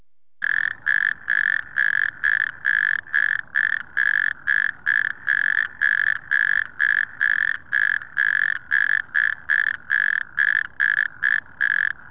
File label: bufo calamita